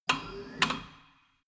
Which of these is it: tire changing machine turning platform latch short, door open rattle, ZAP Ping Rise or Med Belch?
tire changing machine turning platform latch short